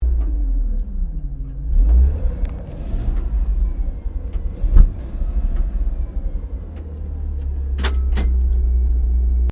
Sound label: APC FV603 Saracen Idle Rev Interior, British Saracen Light armoured vehicle; Trucks (Sound Effects:Vehicles)